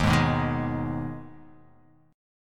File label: D sharp Suspended 4th Sharp 5th; strummed; JGuitar